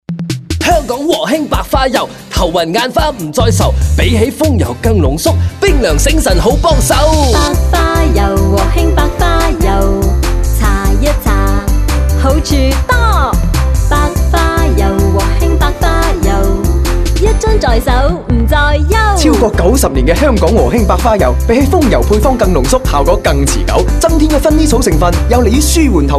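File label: Jingle Canto, Cantonese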